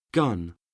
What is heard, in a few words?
gun; Oxford Word Skills Intermediate